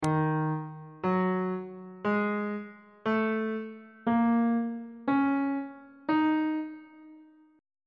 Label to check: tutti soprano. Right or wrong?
Wrong